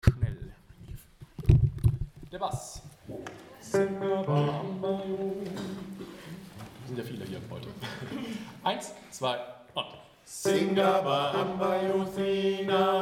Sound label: Singabahambayo Bass